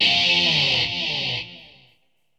GUITAR, ROCK POP; MAGIX

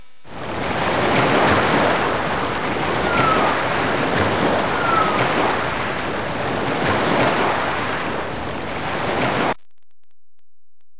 SEA

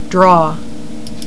DRAW

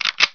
reload

